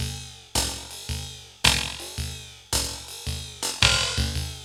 LOOP, TECHNO; TECHNO-BOX; ELEC-LOOPS

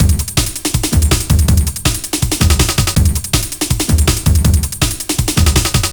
Loops, breaks; M8

